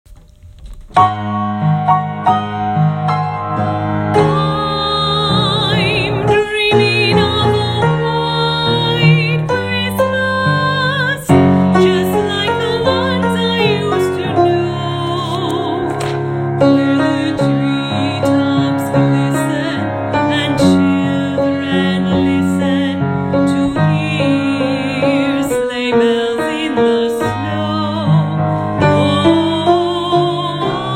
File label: alto